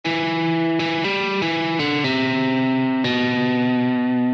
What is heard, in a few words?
rock riff guitar sms